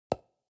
construction wood

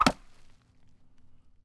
arrow wood, attack impact

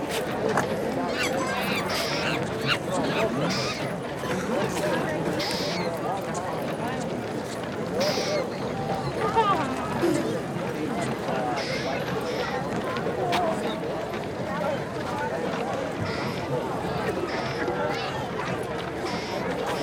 ambient trade, building

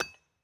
mine stone

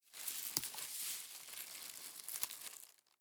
leaves, foraging

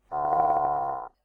camel, fauna movement

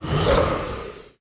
onager hit ground

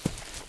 fs grass, singlesteps

